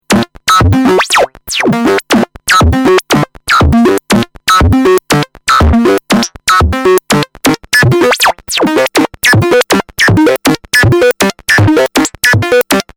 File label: tempo moog